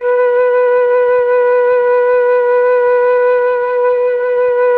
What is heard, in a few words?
MELLOTROF